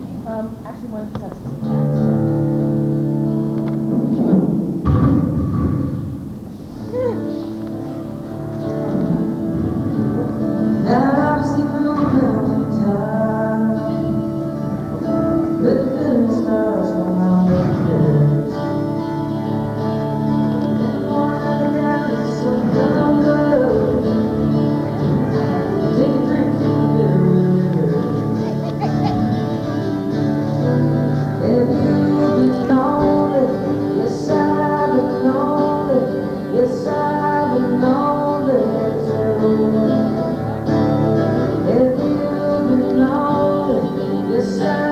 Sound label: soundcheck